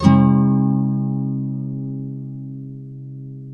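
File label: nylon up minor D; Spectrasonics; Hans Zimmer Guitars VOL-2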